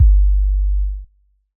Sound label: electronic kick long; soundscrate